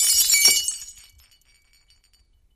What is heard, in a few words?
Glass Jar ( 1 2 Full ) Bottle Breaks; Shards Settling To Still.